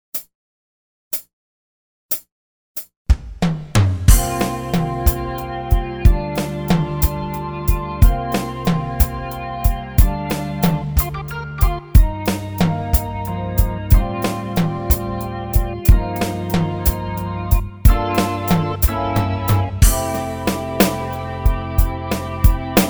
No Sax Key Of C

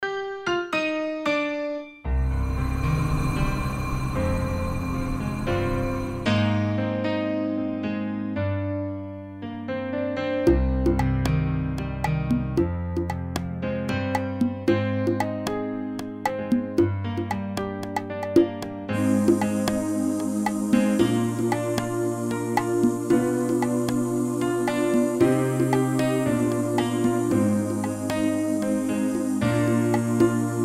Bb, backing tracks

